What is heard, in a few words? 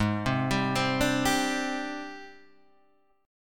Ab Major Sharp 11th Ab down; AbM#11 arpeggiated; JGuitar